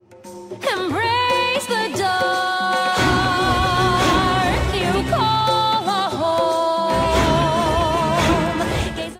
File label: first second lines bagpipes